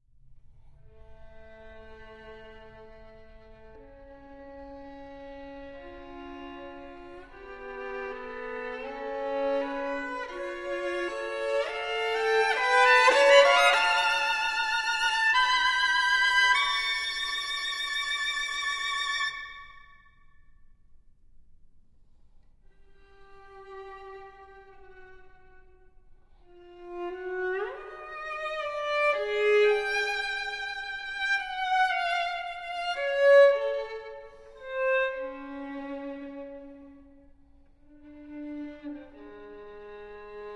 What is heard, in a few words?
Violin Sonata in D Minor, Ballade